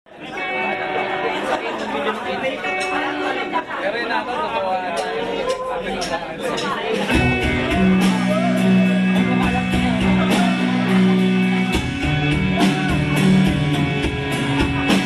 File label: instrumental soundcheck